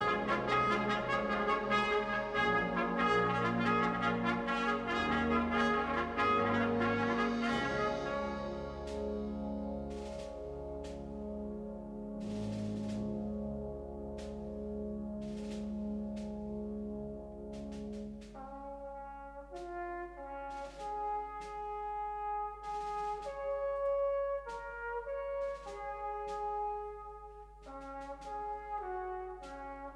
Trompetterkorps

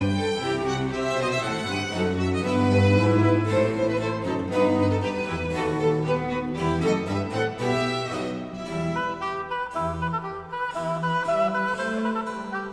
Hoboconcert in F minor; Telemann

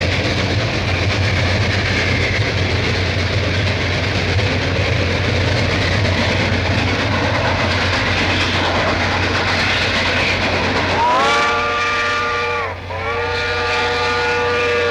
Stoomtrein

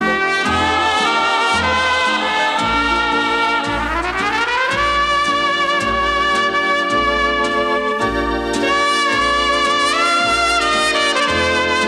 trompet

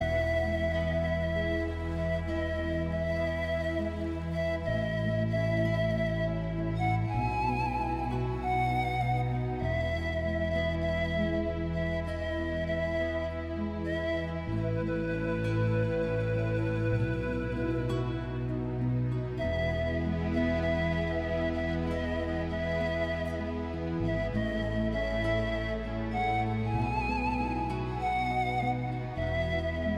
panfluit

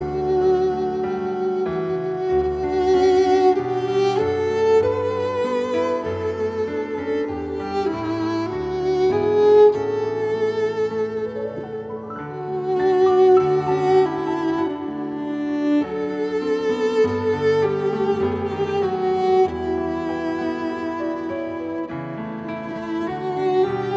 instrum. piano & viool

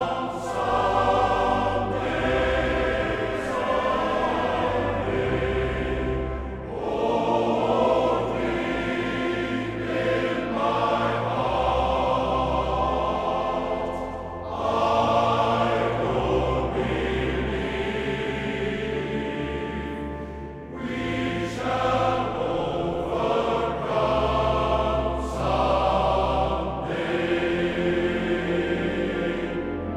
Koor mannen; Mastreechter Staar

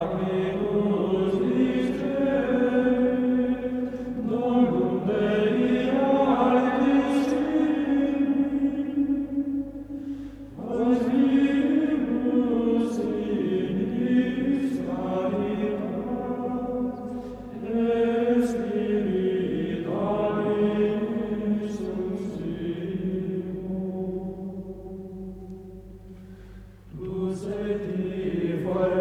Koor mannen Gregoriaans; Veni creator spiritus

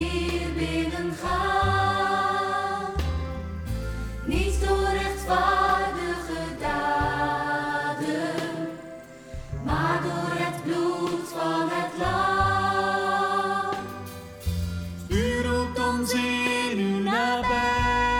samenzang

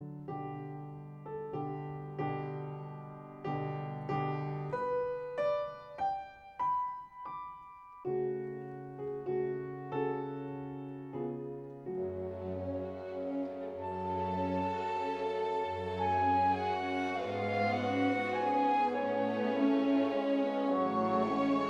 Andante (pianoconcert); Mozart

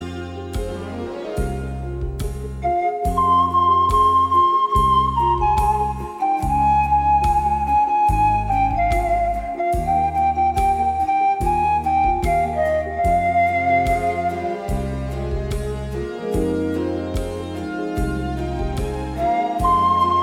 panfluit

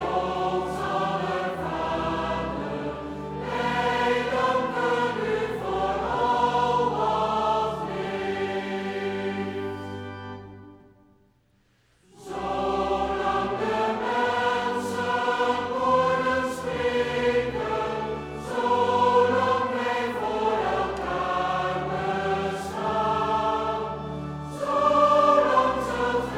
Koor gemengd; Amsterdamse studentenekklesia

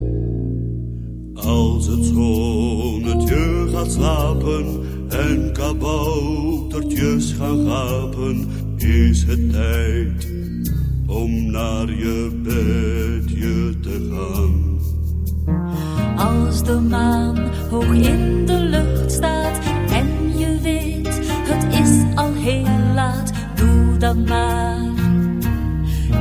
Kinderliedjes

